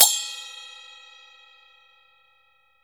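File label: CHINA SM1 L, China cymbal; Spectrasonics - Heart of Asia CD2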